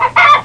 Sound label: chicken